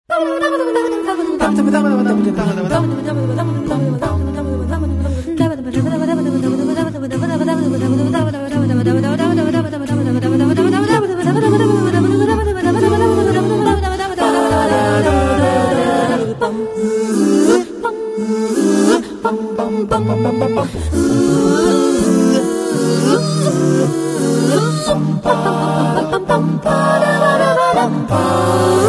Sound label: jazz